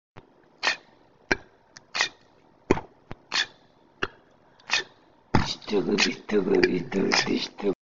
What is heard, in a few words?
Crazy zombie